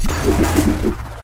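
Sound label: Charging Attack; Moonface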